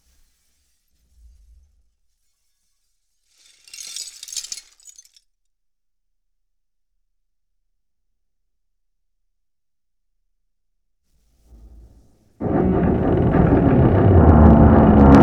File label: Explosion, 5.1 Surround